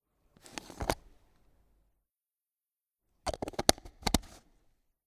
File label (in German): Dose Deckel absetzen aufsetzen